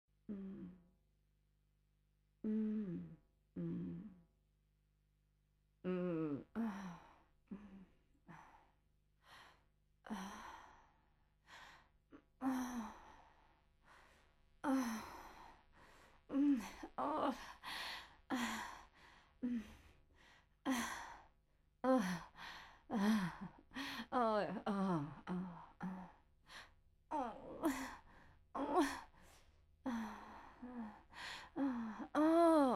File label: old vocals